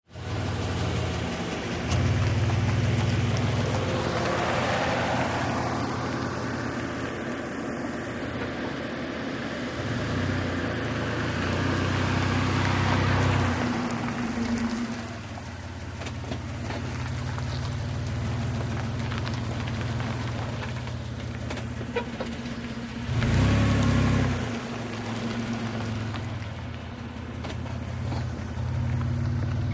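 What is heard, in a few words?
Montero Maneuvering Off, 1998 mitsubishi montero ext.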